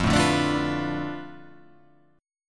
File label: F 6th Flat 5th F down, chord